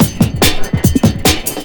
SPEED, Big Beat Drumloops; USB Soundscan vol.25; AKAI

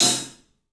club hat; 3D Sound; 3D Drums

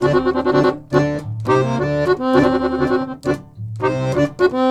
Balkanish Traditions; USB Soundscan vol.63; AKAI

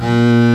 BOWD BAS, FAIRLIGHT CMI IIX STRINGS

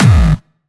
Distortion Kicks, Bassdrums

